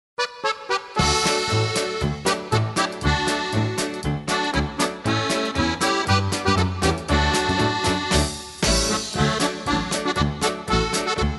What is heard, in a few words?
Polka